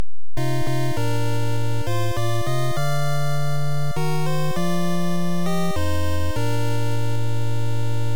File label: 1st Period Start; Hit the Ice; NES